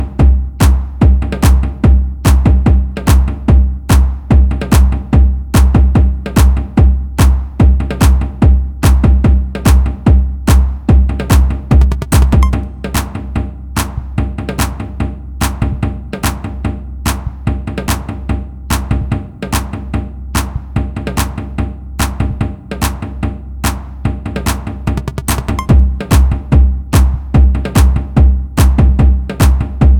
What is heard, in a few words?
Drum Wood